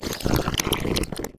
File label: imp chatter, monsters; DoomRTX; imp5